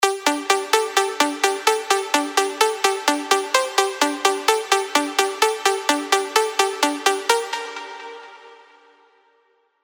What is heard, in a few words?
Gmin (128 BPM), prog arps